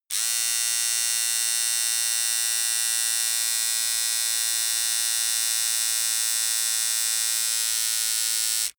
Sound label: servo twangy long